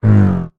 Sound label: Werebeaver Death Voice